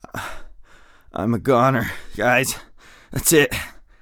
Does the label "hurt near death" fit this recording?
Yes